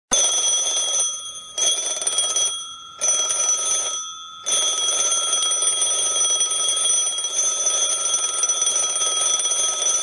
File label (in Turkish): çalar saat alarm ve zil sesi